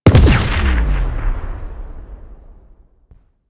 Missile Launcher Explosion C; Weapons; Warframe; Railjack

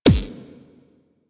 Shield Hit Notification; Enemy Ship; Rail Jack

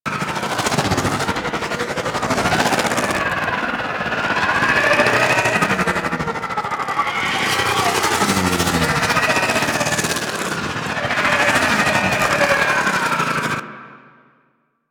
Skiff Loop; Warframe; Eidolon

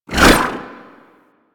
Melee Long Whoosh; Warframe; Weapons

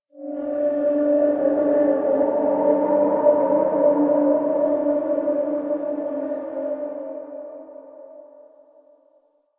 Eerie Drone

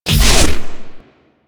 Door Close; Galba

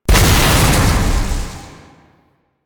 Explosion Medium; Weapons; Warframe; Duviri; Incarnon Adapters

